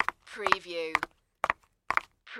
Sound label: Footstep High Heel